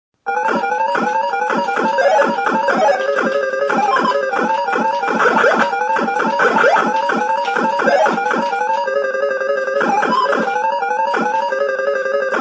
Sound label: video game; Playing Bosconian video game; Video Games; Sound Effects:Games